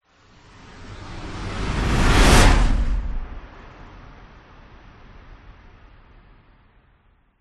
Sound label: whoosh; orlando lanzini